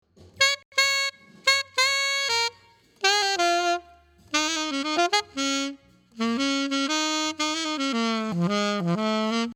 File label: sax processed